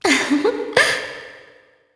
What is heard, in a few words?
Vox Happy; kingsraid; voices heroes